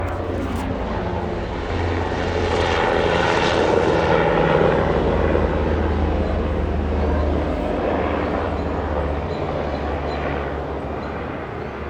Bi Plane Flyby

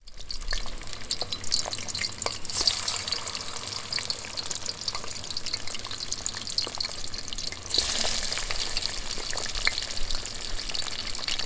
Food Frying

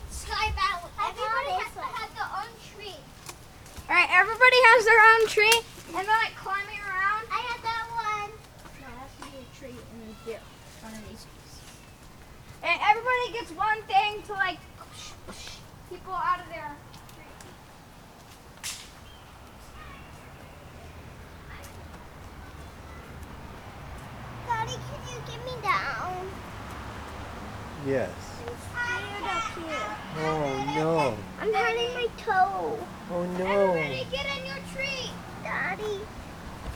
Kids Playing

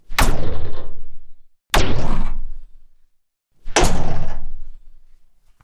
Metal Trap Door Shuts

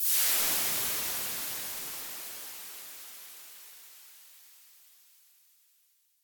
Hot Object Quench

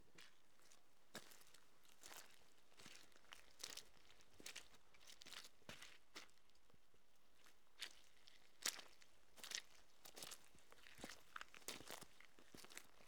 Footsteps On Soggy Gravel Clean

